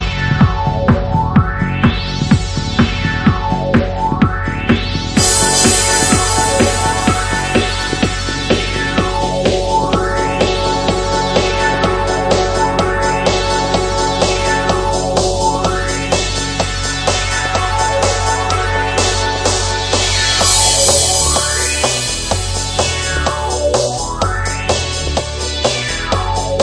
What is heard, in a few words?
original extended instrumental mix, disco